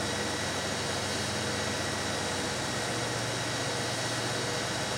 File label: t90a engine rpm 00, tanks; War Thunder